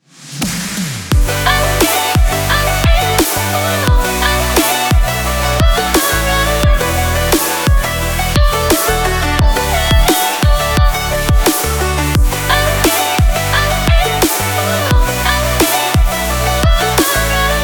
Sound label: upbeat advertising music